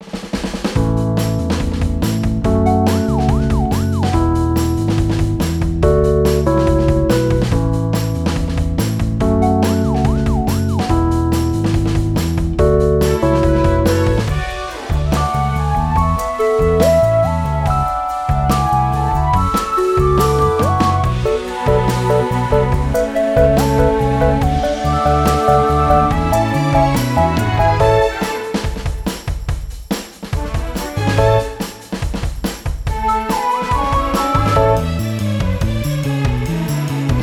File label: Energetic BGM that raises tension